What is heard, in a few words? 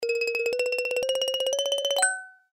increment; Ares God Of War